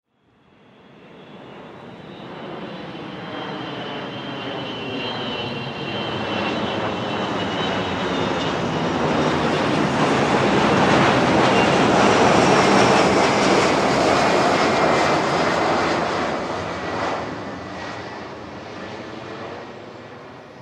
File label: Warfare Jet KC135 Pass By Fly; Airplanes; Vehicles